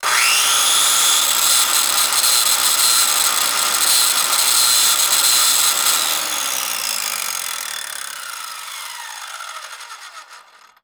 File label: Circular Saw; Tools